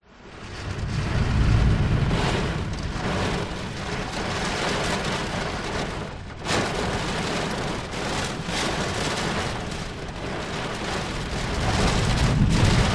nature rain heavy windy storm thunder in car perspective; zapsplat